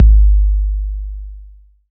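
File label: bass drum 808; Best Service Gigapack 2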